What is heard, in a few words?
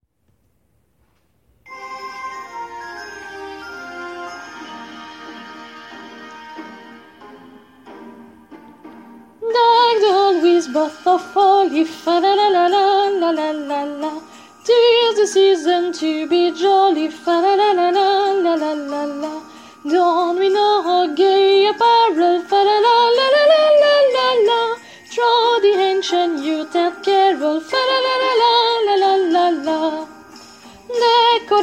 Deck the Hall voix